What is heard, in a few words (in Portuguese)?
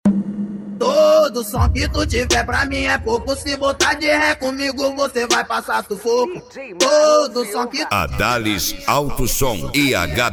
esp de cavalgada; adales auto som; hb20 panther black